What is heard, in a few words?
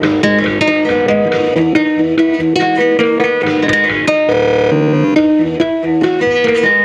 guitar